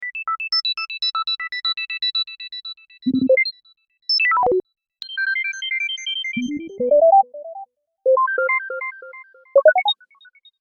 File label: Beeptronic